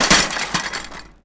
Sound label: bike fall; Golden Crayon Sounds